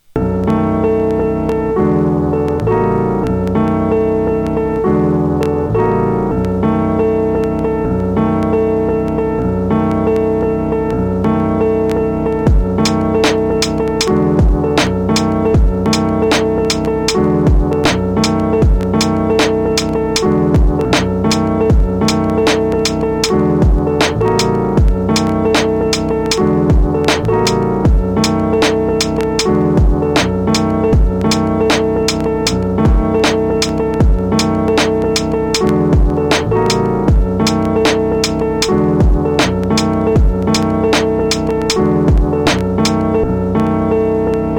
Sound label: Piano Doubler G